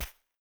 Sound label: Panel Specimen Place; Among Us